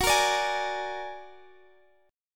A sharp Augmented 7th F sharp

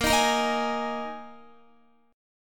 Bb 7th Suspended 4th Bb Guitar 4E,4A,5D,5G,5C,6F

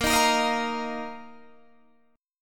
Bb Suspended 4th Bb down, chord; strummed

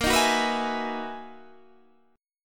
B Major 13th A sharp, guitar

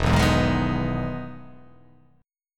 E Major 7th E down

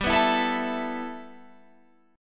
A 7th Suspended 2nd, G

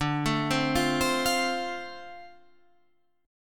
D-Minor 7th D Guitar Half Step Down, chord

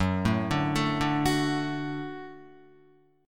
Gb Minor Sharp 5th Gb down Guitar; Gbm#5; arpeggiated; JGuitar

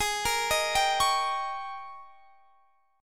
G sharp Major 7th Suspended 2nd Suspended 4th

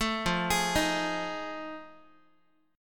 F 7th Sharp 9th, Guitar; F7#9 arpeggiated; 12,13,11,13,x,x

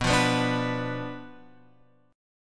B Diminished B down Guitar Open F; Bdim strummed

